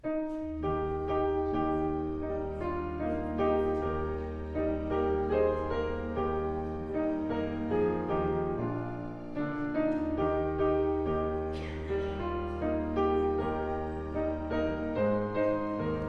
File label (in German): Vorspiel